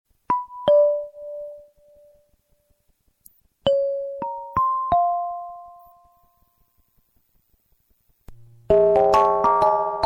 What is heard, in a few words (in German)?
Metallophon; metallophone